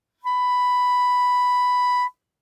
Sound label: Bb Clarinet ff B5 stereo; Woodwinds